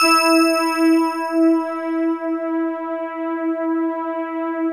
syn e3, SYNCLAVIER; Dagbar

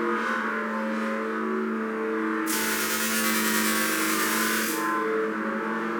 Panned Organ, non tempo loops

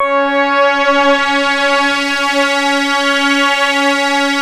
C#, Synclav FM; Big Fish Audio; SPACE1

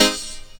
P. CHORDS, KEYS

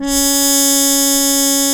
C#4, BRASS

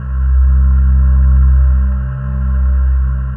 SYNVX; Greytsounds; Scott Peers Sound Engineering

